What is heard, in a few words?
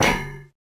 metal grate, Footsteps; civ13; civ14; Plating